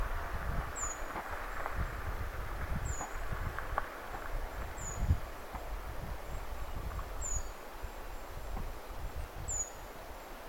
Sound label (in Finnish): ti puukiipijan aantelya; ti-puukiipijä, 2